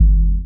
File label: Kicks; Heartbeats